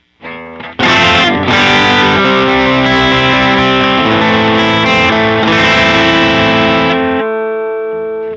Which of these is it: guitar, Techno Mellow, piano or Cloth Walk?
guitar